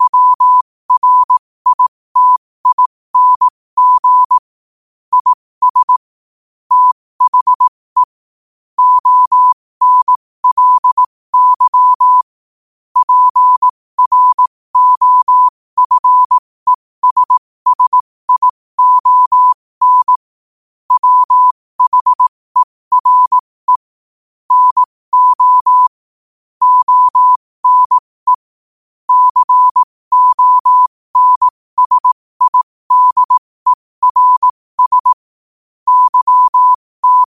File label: 5 wpm, morse